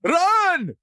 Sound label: town human far; Blind Drive